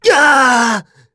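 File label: Vox Damage, Korean; Lucias; kingsraid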